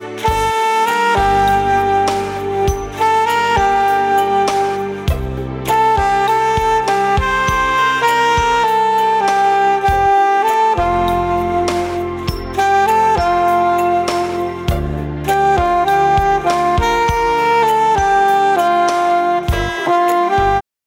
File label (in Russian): alto; Простых мелодий для саксофониста